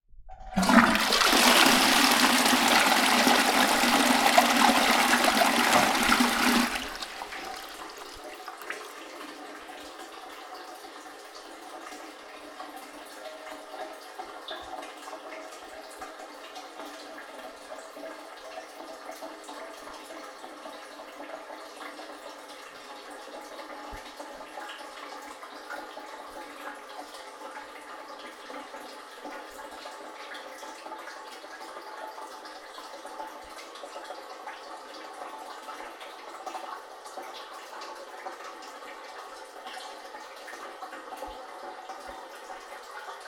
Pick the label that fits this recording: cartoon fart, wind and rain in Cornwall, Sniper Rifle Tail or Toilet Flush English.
Toilet Flush English